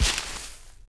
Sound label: Animal Foot Large; Civ4 warlords